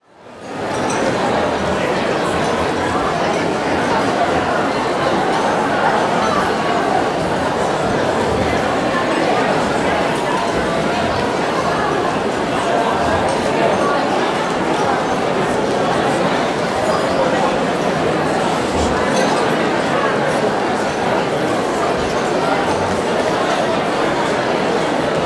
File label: Convention Active Banquet Hall, Walla; Sound Ideas; Walla Series